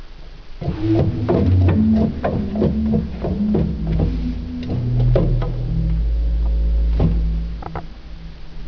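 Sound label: Subbass, organ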